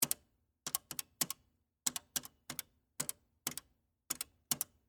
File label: Pay Phone Dial, Technology, Telephone, Dial, Pay, Phone; Telephone Sound Effects; BlastWave